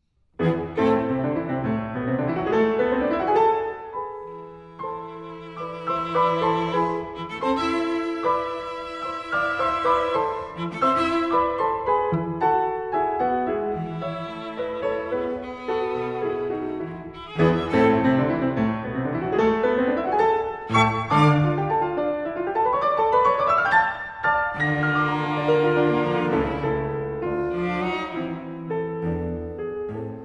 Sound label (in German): Klavier Trio